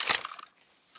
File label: weap magout plastic, weap; Escape from Tarkov